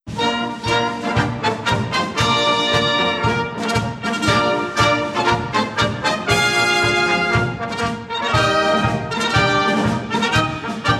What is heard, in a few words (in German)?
Marsch; Castaldo